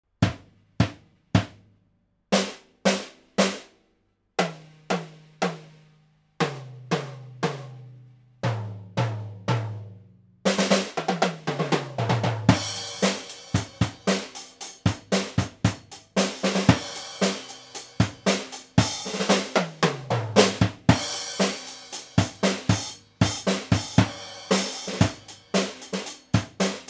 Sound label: no mute, drum